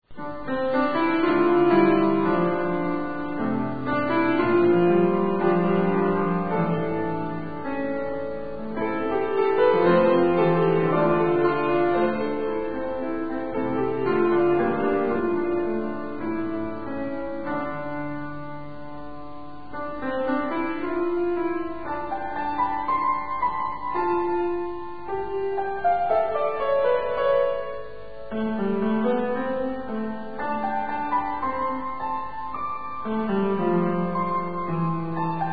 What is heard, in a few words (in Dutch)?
Pianomuziek; dorpskerk